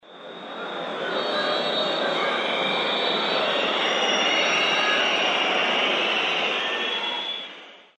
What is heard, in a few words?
crowd boing